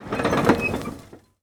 wheel turn

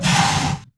ATTACK; mahlendur

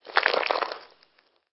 slide

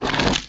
grind

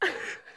AMBIENT; mahlendur

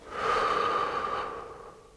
smoke joint

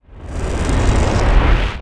teleport cast

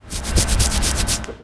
HUMAN SCRATCH

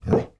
ambient short

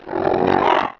HURT; mahlendur

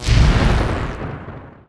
thunderball collide

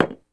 PLACE BOTTLE

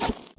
scratch

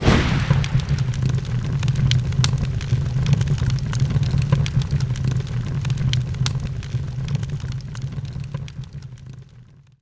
fire spell human burn

